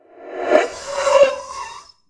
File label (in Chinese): 死亡, 鬼, 幽灵; Enemy